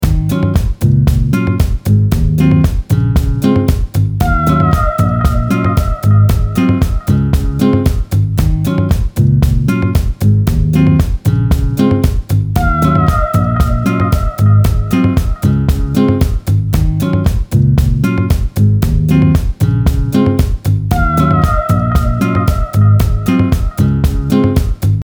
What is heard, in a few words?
Soul Jazz Afro